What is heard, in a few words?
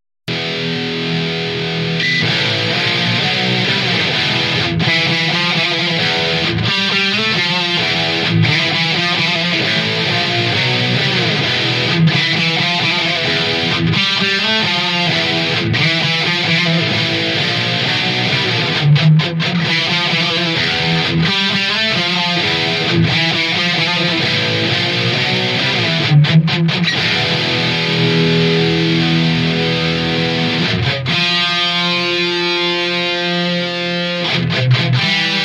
mesa boogie mark iii blue stripe kettner access preamp guitar tone; guitars only